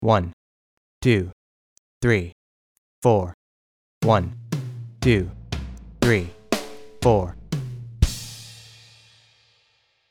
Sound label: Basic Fill